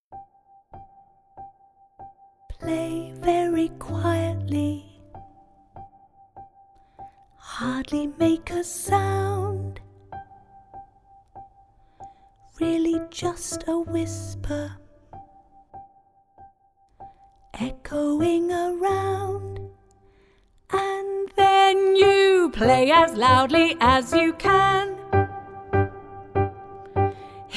Quiet and loud; Music-makers

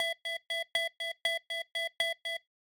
remote control; Robot Boogie, Alice's remote control

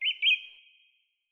song thrush; song thrush 1

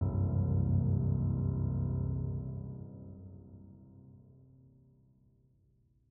Hush, Lullay, excerpt

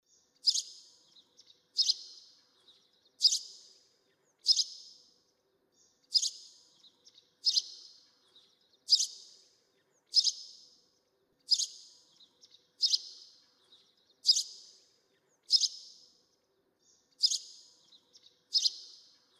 Bird tweets

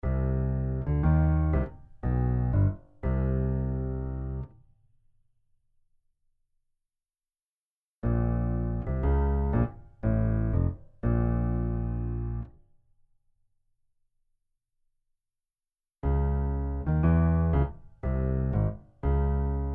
Melodic motif, transposed

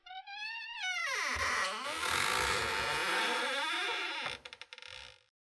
creaking door sounds

